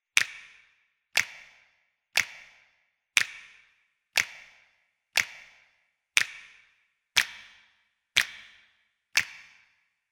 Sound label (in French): CLAQUEMENT DE DOIGTS